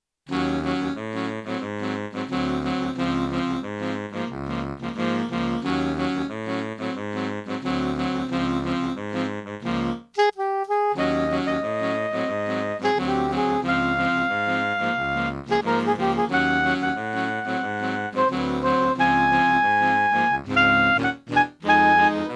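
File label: Sax Quartets, bossa nova; A fresh approach to this jazz standard...........bossa nova!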